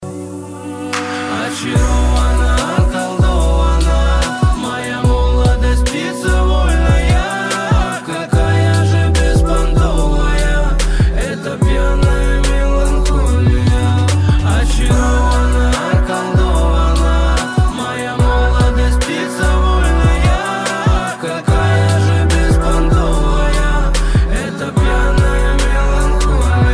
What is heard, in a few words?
russian ringtone